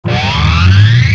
stun count; cso

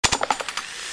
thanatos draw

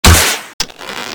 bow charge shoot

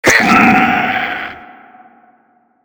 resident skill; cso

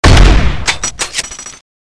thanatos shoot; weapons

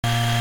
laser minigun charge loop

